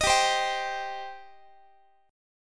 G Suspended 4th Sharp 5th, Guitar; Gsus4#5 strummed; x,x,18,17,16,18

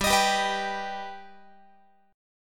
G Augmented G Guitar; strummed

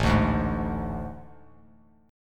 A Major 7th Suspended 4th, Bass Standard; AM7sus4; strummed; JGuitar

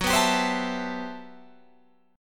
G Major 13th

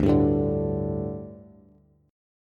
D Suspended 4th D, down, Bass; strummed

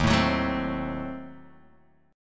D sharp Diminished 7th D sharp Guitar Open F; strummed; D#dim7; 3,4,6,4,3,x; JGuitar

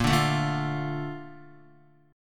Bb Major 7th, Bb, down, Guitar; BbM7 strummed; JGuitar

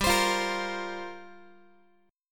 G Minor 11th, Guitar; Gm11 strummed; x,15,15,15,14,x

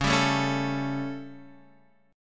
G Suspended 2nd Flat 5th Db, chord

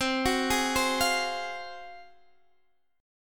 G sharp 13th C

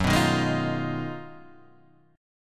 G sharp Minor 13th F sharp, guitar chord; G#m13/F# strummed

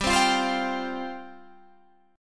F Suspended 2nd G

